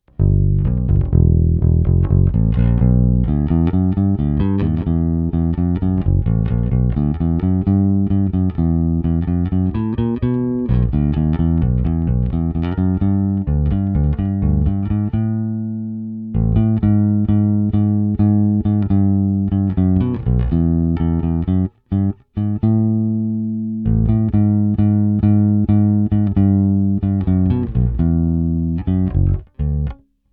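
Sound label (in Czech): Palisandrový hmatník - tónová clona otevřená - "nový" krk s palisandrovým hmatníkem, Precision bass, Struny La Bella Deep Talkin Bass flatwounds; Téma: Jay Turser Precision bass JTB-400C-BK-A-U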